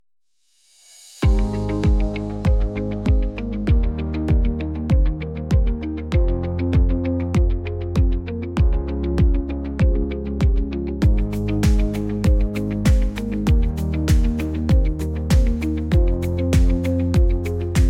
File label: Techno Triumph